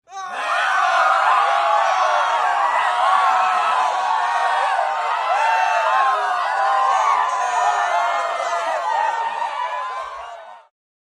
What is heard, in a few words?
Crowd panic and fear